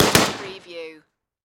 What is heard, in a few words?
Machine Gun STG44